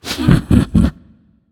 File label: heh heh; smite; xbalanque